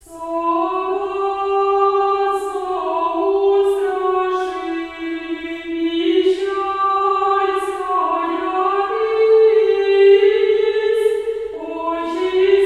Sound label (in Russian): Пятидесятница ирмос 1 песни; Пятидесятница ирмос 1 песни 2016